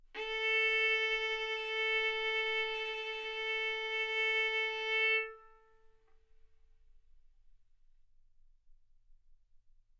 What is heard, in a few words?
Violin arco A4 B4; mf; sul A; stereo